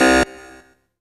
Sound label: RAVE, RAVESYN